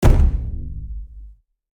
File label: RC Ball Hit; Scraprun; Deathrun